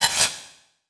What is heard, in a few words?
Transition Hit Impact Electrical; Production Element